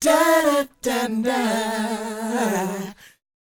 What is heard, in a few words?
DOWOP E; ILIO; Vocal Planet